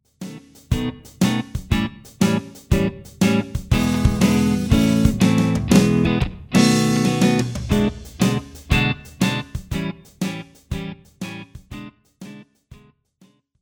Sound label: turnaround E